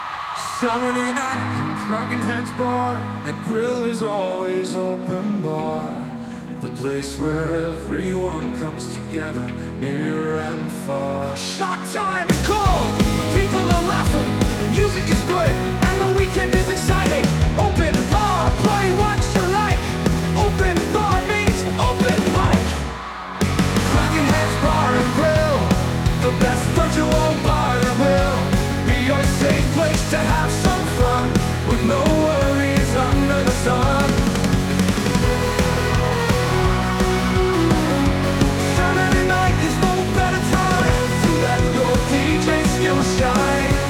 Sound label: Live Rock